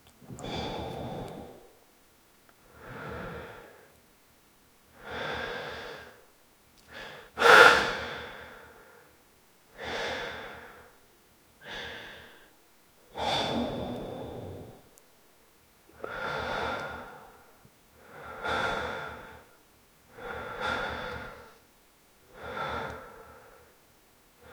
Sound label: 18 years old man holding, close mouth